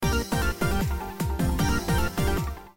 Big Win, slots; The Lucky Fairy

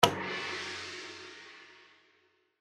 Pick Brick; Kungfu Showdown

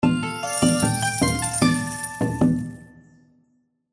Jackpot Animation, slots; Majhong Royale

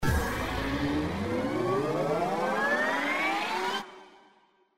SFX Anticipation; Chinese New Year Deluxe

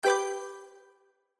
SFX Reveal Amount; slots; Panda Majesty2

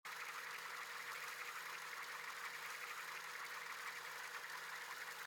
SFX Spin, slots; Panda Majesty2

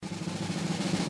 Box Click; SLOTS; SFX; Chinese New Year Deluxe

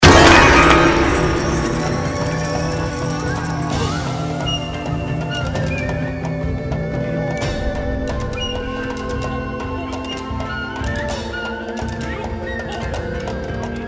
BGM Bonus Credit, SLOTS; Kungfu Showdown

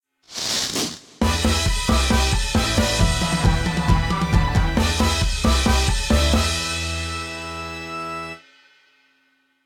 SFX Symbol Jackpot Win; FinWin

